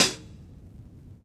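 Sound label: Vinyl Half Hat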